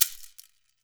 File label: GANZA, Brazil Drum Perc; E-MU Producer Series Vol. 7 – Old World Instruments